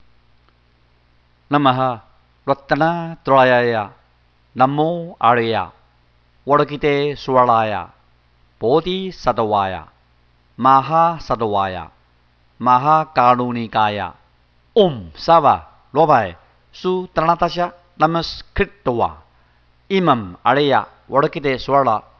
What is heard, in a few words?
Chanting; Boutsulin Vihara